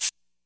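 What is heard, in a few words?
classic hip hop shaker; STYLE KITS (Hip Hop, Etc); Classic Hip Hop Mega Kit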